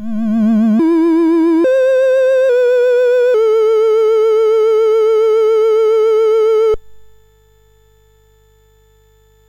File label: sid MOS6581R4; Windwalker